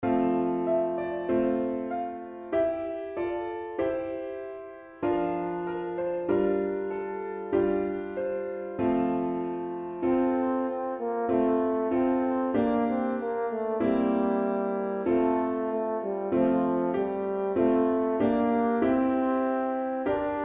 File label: venturing out french horn with solo